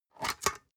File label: storage lid slide open hard; objekte